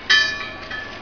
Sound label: nautical